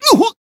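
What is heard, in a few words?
damaged, Japanese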